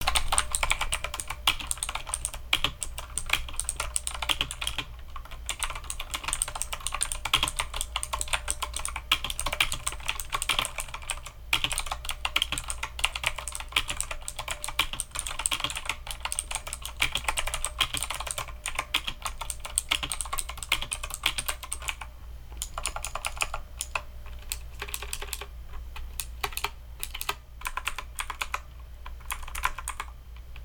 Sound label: Typing